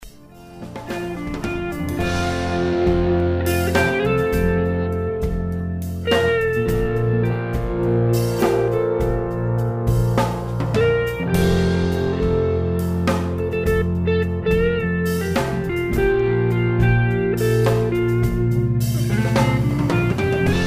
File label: Morning Bells; MoodDocs